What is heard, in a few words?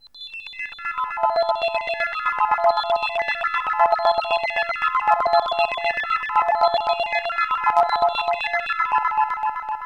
ARP BELLS; SYNTH SEQ; AMG; Global Trance Mission VOL-2